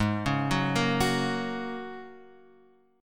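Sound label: A flat 7th Suspended 2nd Suspended 4th A flat Guitar Half Step Down, chord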